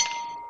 ting; BALLY; CORVETTE; Son Corvette Original

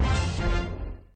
total bonus

